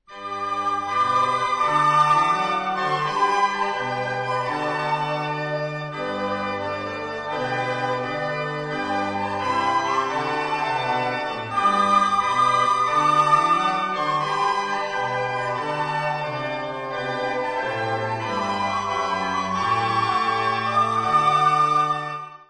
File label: Trumpet Tune